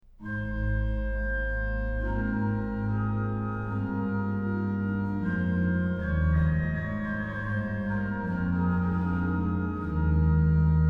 Liebster Jesu, wir sind hier, organ; BWV0706; Kirnberger; BACH; BACH ORGAN WORKS; CHORALE PRELUDES

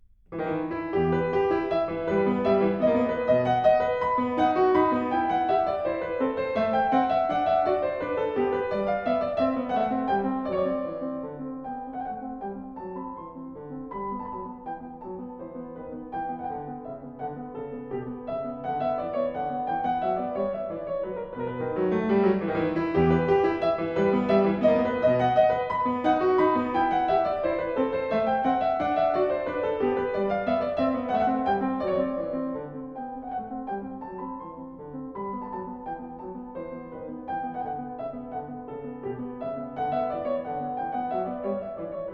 Prelude In E Minor; BWV 938; BACH; COMPLETE SOLO CLAVIER WORKS; TOCCATAS PRELUDES FANTASIAS

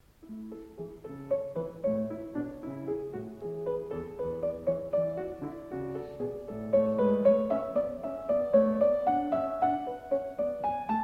Prelude in A major, Clavier; Bach; The Well-Tempered Clavier; Book 2; BWV 888

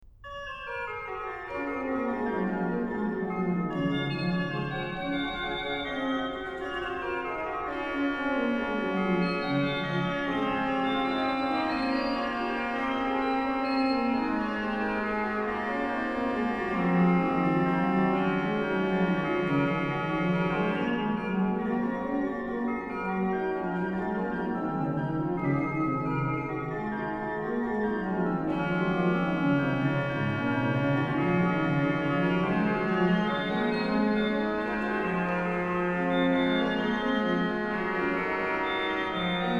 Canone all'ottava, ORGAN; BWV769a-1; Canonische Verдnderungen ьber Vom Himmel hoch da komm ich her; BACH; BACH ORGAN WORKS; CHORALE PRELUDES

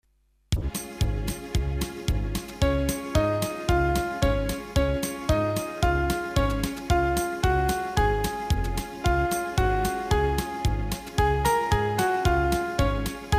Instrumental, karaoke, Bulgarian; Bulgarian Folk Songs; Children

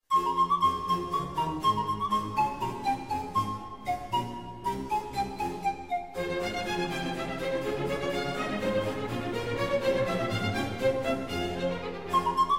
Concerto for flute & orquestra, Pan Flute; F minor; Vivace D major

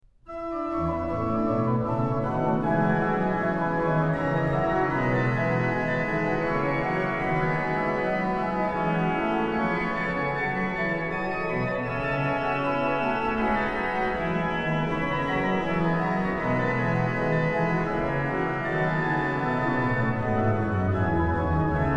ORGAN, Chorale Preludes; BWV0600; Gott, durch deine Gute; BACH; BACH ORGAN WORKS

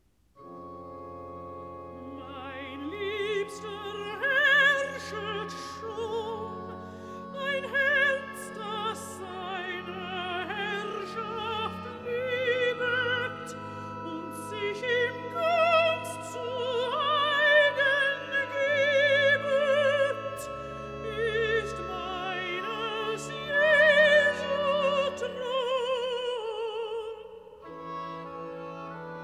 Recitative; Cantata #5; Mein Liebster herrschen schon; Bach; Christmas Oratorio, BWV 248; Ph. Ledger

